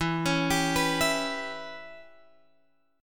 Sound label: E Minor 6th guitar chord, Drop D